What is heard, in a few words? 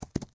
Horse Walk